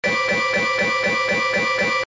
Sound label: loop